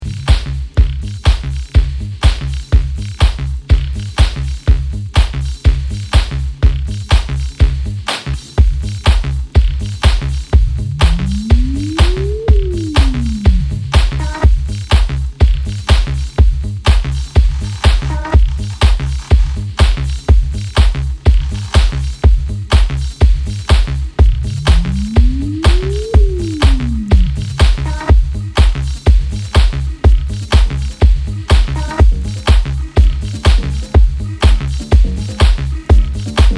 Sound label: remix